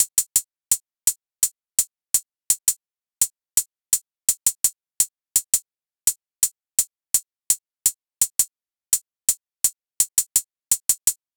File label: Hihat Loop - 84 BPM; Cherry; Cymatics; ANALOG EVOLUTION Beta